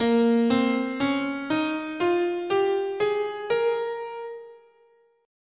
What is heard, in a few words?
Asharp Dorian